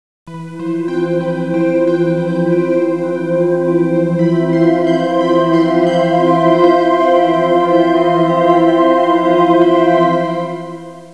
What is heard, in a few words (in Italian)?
audio suonerie musica mistica